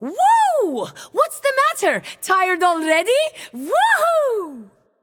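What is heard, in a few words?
yay, non-verbal; smite; voicepack; tiamat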